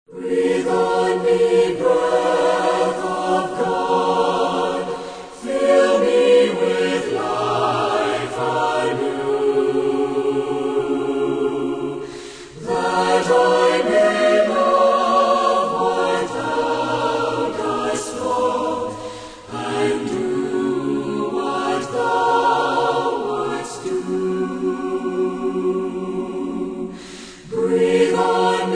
Choir And Orchestra; Breathe On Me, Breath of God

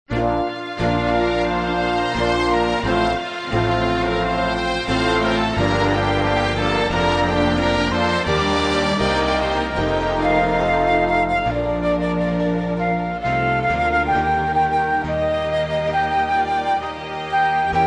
Instrumental Hymns

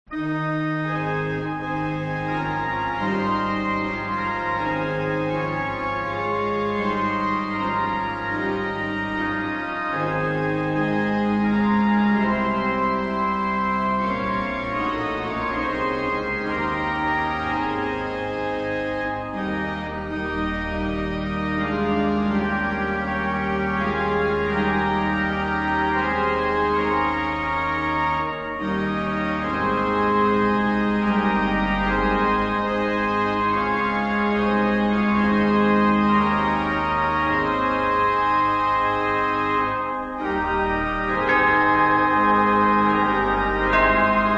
Cathedral Organ And Chimes

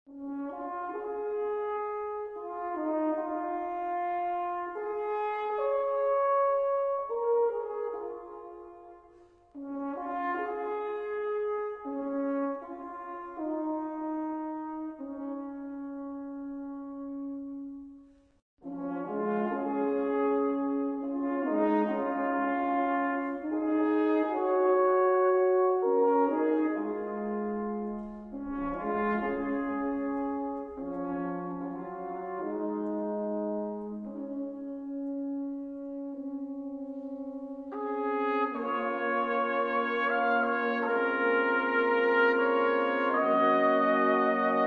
Brass Choir; My Shepherd Shall Supply My Need

